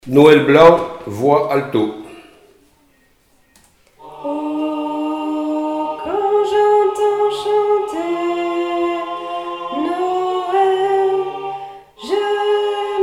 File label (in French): voix alto